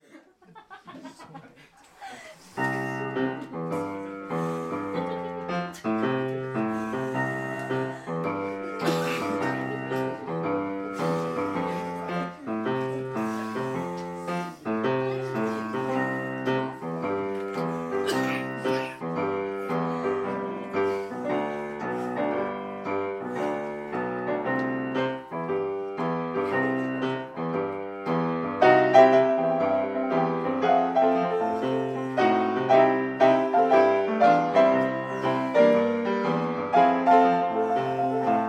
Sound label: Tadam, piano boogie